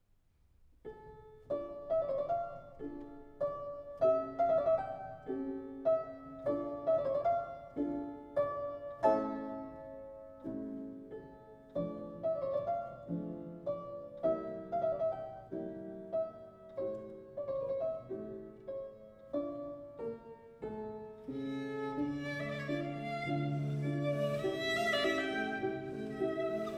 Sonata for violin & piano in F major, Andante; Mozart

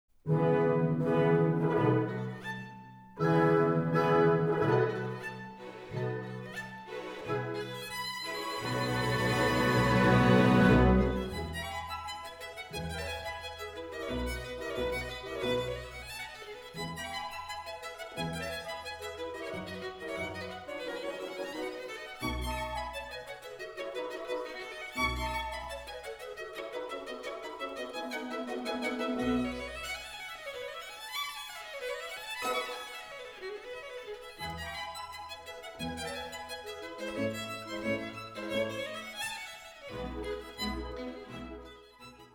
Concerto (Stereo); Denon Audio Technical CD

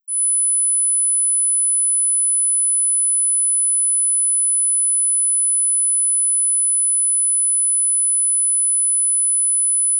10kHz

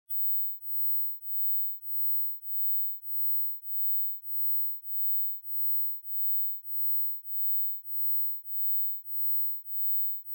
15999hz Sine Wave 0db L+r; Denon Audio Technical CD

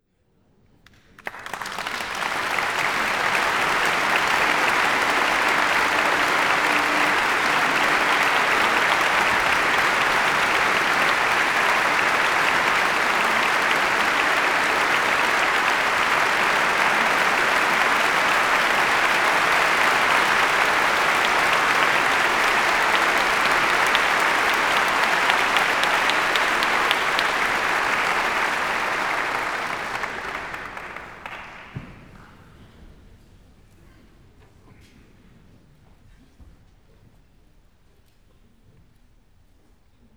Applause; Joseph Haydn; Symphony in G major, Hob.1-92 'Oxford'; Sergiu Celibidache

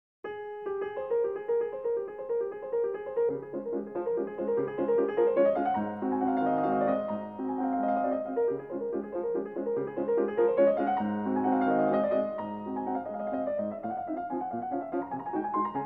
Waltzes for piano, D flat; Chopin; "Minute"

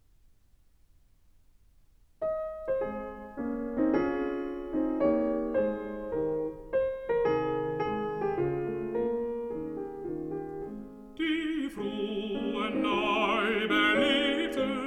song for voice & piano

